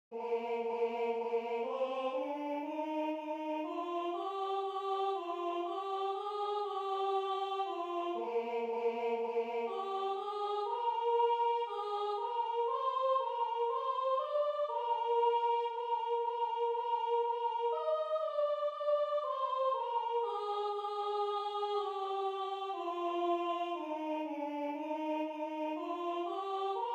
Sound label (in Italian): Gustate e vedete Soprano; Base soprano